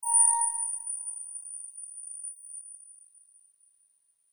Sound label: shines